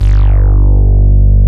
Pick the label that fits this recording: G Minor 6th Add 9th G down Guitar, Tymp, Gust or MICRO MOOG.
MICRO MOOG